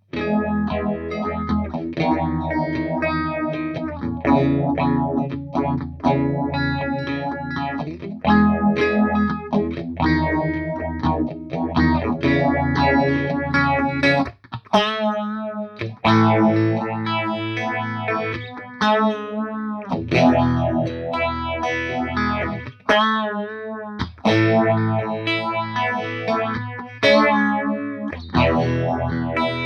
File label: phase